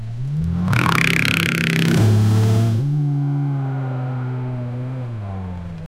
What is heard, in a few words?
dodge challenger hellcat